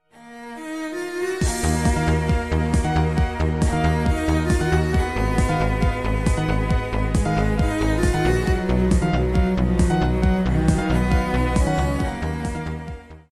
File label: Violin